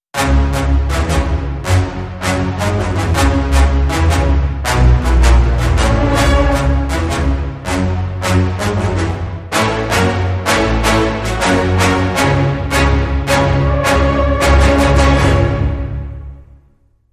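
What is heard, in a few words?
Hybrid Sting, Orchestra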